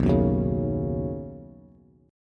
B Major Add 9th B Bass; Badd9; strummed; JGuitar

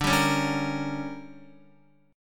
D Major 7th Suspended 4th Sharp 5th, Guitar; DM7sus4#5; strummed; JGuitar

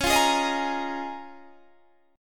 C sharp 6th Add 9th C sharp down Guitar Standard; strummed; C#6add9; JGuitar

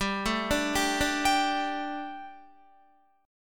G Suspended 2nd G, down, Guitar; Gsus2; arpeggiated

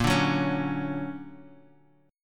D Augmented Major 7th, chord

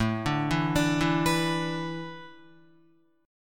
A Suspended 2nd Suspended 4th, Guitar; Asus2sus4; arpeggiated; 4,5,4,7,4,7

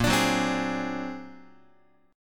Bb Augmented 9th Bb down Guitar; 4A,4E,5A,5D,5Gsharp,6Csharp; Bb+9 strummed; JGuitar

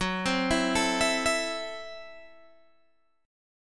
F sharp Minor 7th Flat 5th F sharp Guitar Open G

